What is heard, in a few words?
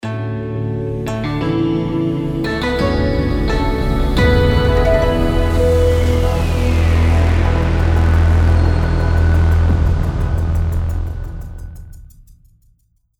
Level Intro Jingle; Nyakuza Metro